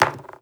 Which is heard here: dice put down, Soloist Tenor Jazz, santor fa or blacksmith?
dice put down